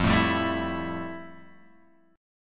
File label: F Major 9th F, Guitar, Open G